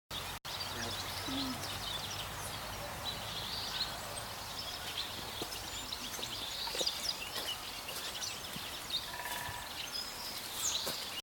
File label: Canary Voice